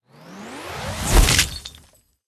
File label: booster lollypop hammer use; Candy Crush Friends